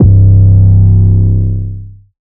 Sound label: 808 mogul C, Tonal; Hip-Hop; Slate; CAICOS Hip-Hop Sample Pack